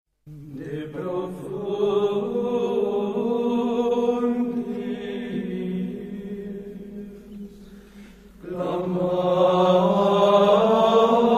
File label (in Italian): Canto Gregoriano De Profundis